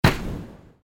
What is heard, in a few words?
shot